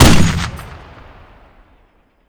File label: 50 cal single; bms